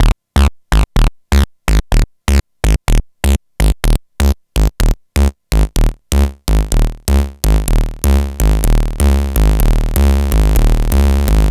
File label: Building Bass; Sonic Foundry (Sony Creative Software); Underground UK House & Electro